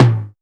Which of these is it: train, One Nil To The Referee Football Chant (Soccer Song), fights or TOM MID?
TOM MID